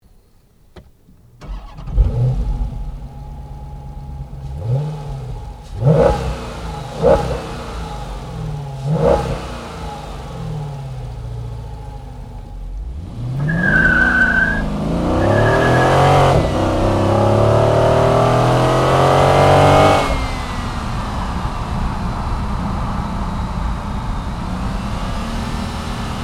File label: Dodge Charger SRT8